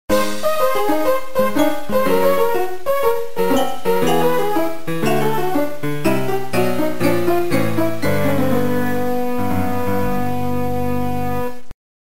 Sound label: you Lose Music